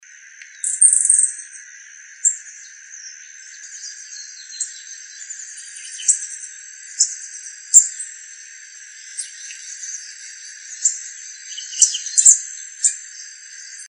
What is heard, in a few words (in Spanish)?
Picaflor Verde, Picaflor Común (Chlorostilbon lucidus)